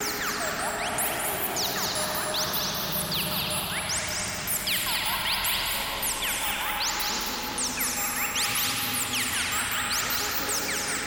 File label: birds murmuration